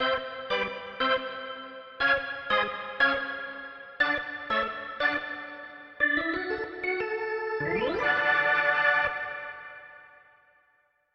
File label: organ